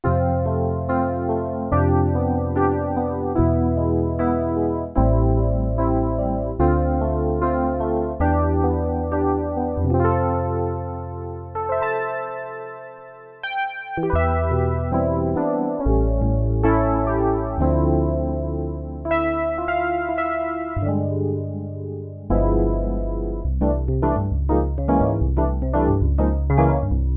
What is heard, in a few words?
Piano, Roland JX-8P